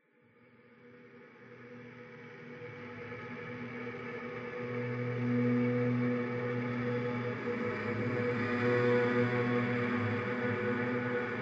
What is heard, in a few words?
Interior Reverses